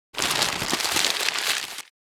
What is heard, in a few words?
Newspaper crumple